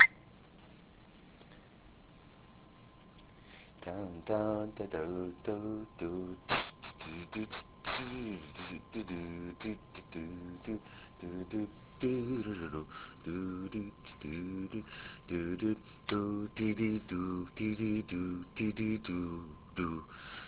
Idea jazzy Voice Only

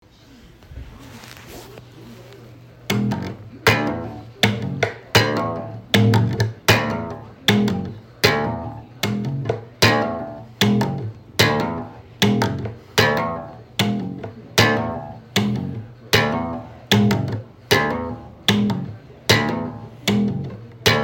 bass

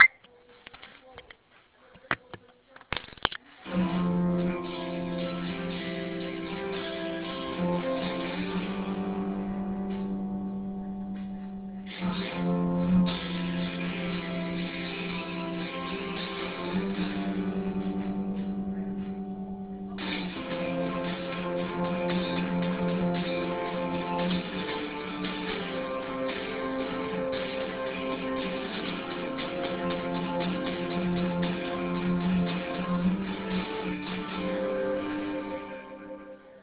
Idea Guitar

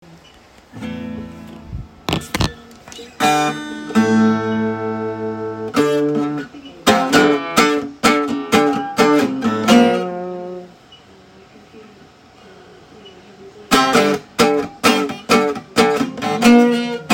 guitar line

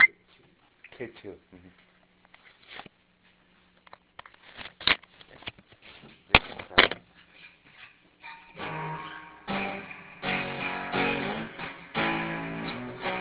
Guitar; Power hungry

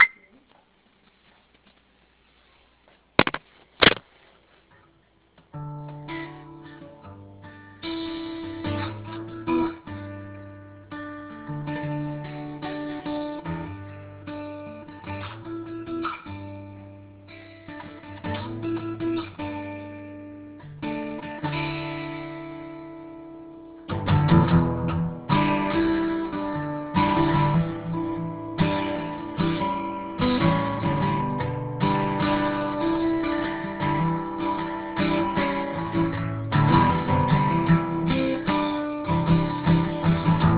Guitar Slower Sad Song

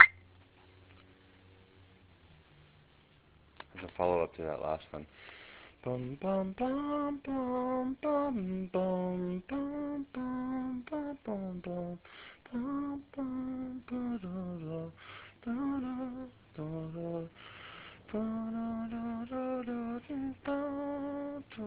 Idea Voice Only washed up

